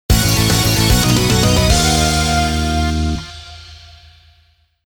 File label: Extra Fanfare; soldam kaika sengen original soundtrack 2017